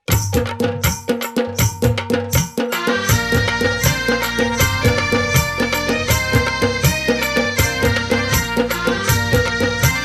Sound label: Karaoke Marathi